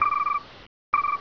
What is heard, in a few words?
Game Bird; FishingBass